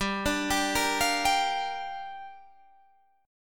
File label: G Minor 7th; Gm7; arpeggiated; 15,17,17,15,18,15